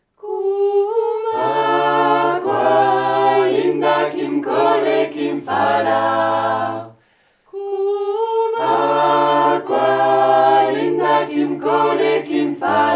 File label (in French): Corse Acque Linda Trois Voix